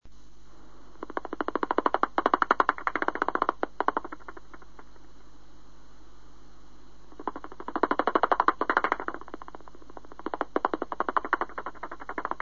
Zwergfledermaus

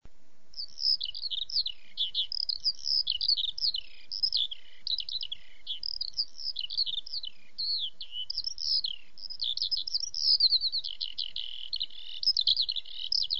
feldlerche

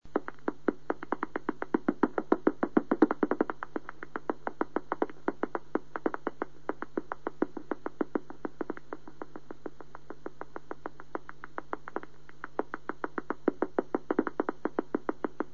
mausohr

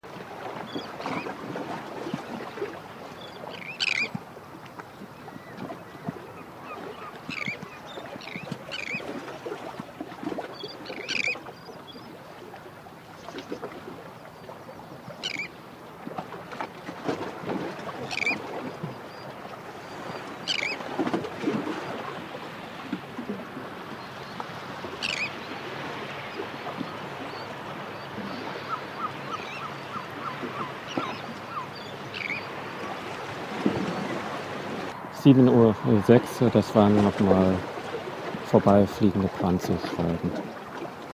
Brandseeschwalbe Sterna sandvicensis

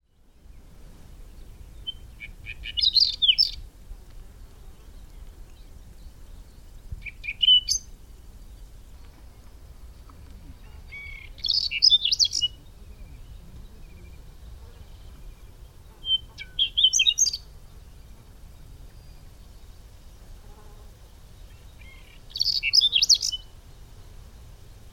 braunkehlchen; Stefan Wehr

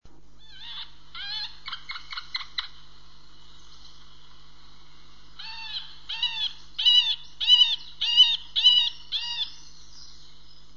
Mittelspecht

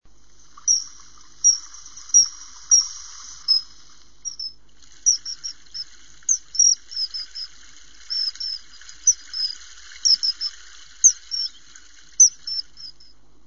Eisvogel

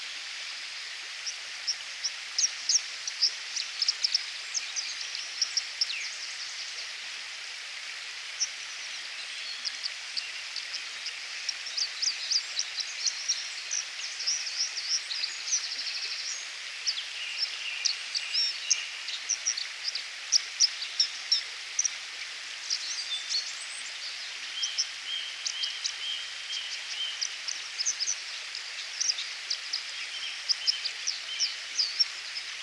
wasseramsel entrauscht

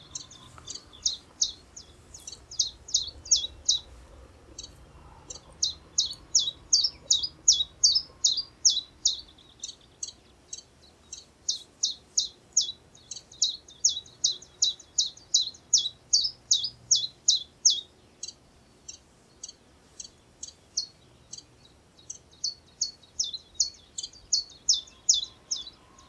zilpzalp

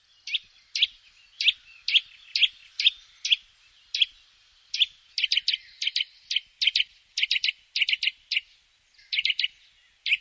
kleiber

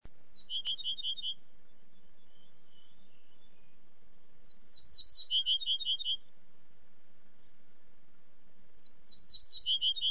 ortolan

